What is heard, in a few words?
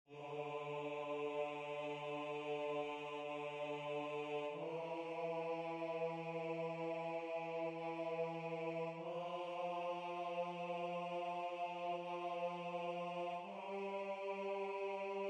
tenore